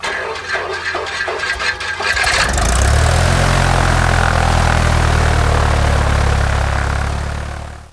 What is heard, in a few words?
prop plane